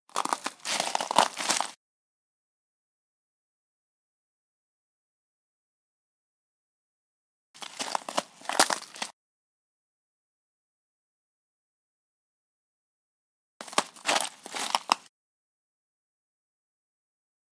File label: Ice; Google Earth VR; Lotr